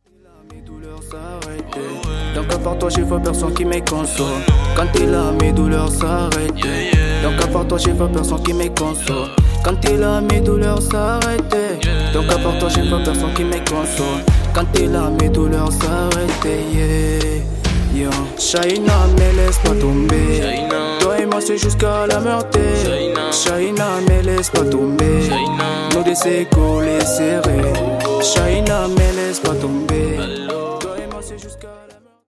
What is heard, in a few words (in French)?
musique